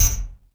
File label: KIN DRUM; Crypton; DrumBase5000; PERC.COMBI